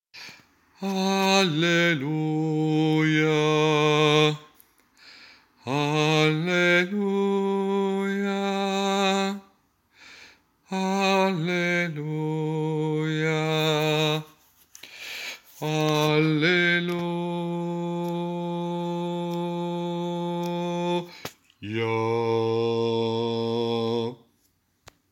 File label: BAS; HALLELUIAH RITORNELLO